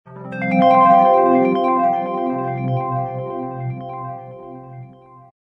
magic harp swirl; fantasy

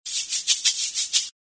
rhythm shaker

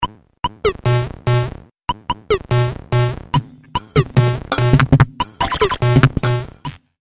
minimal glitch ringer

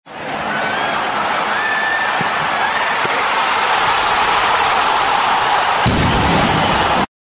soccer goal score; sports

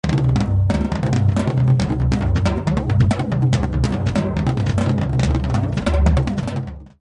African Drums Alert

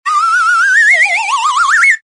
comedy whistle warble up

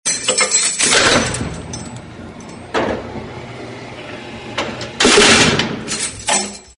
creaks door cell open with key

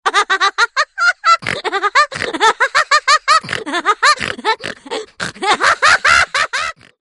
Cracking Up Laughs Laughing Alert

